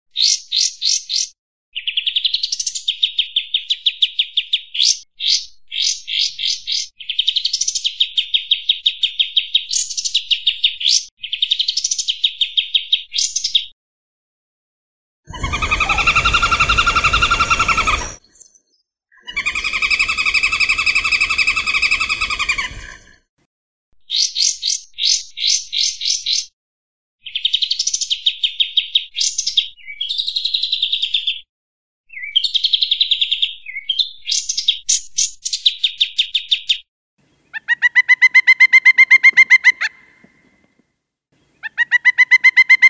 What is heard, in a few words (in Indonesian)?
kombinasi kapas tembak pelatuk